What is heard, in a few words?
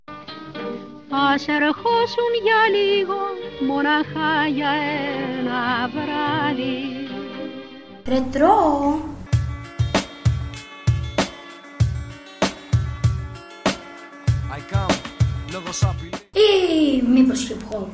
music spot